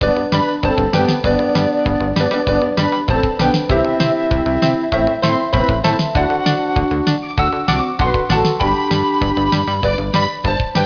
jingle bells

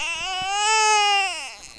cry

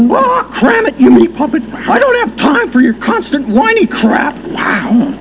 whiny; Cram it you meat puppet. I don't have time for your constant whiny crap!

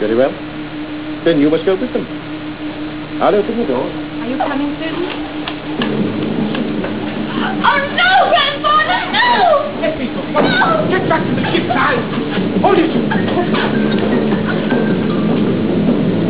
takeoff

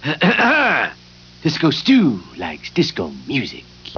disco

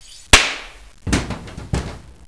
guilotine; halloween

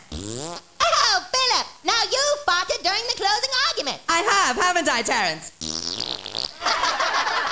fart

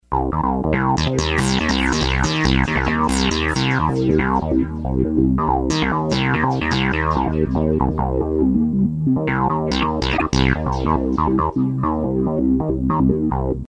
squelchy sequence

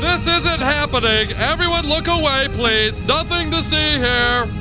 error

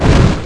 thud; loud 'thunk'; rocket was embedded in the ground; about 30m from where we were standing

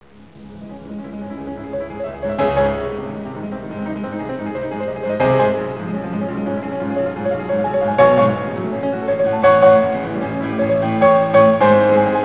beethoven piano; Presto

